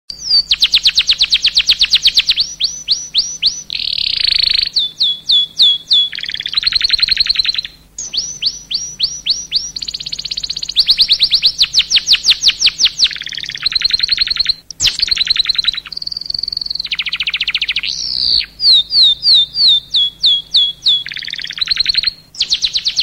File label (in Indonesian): Bird kenari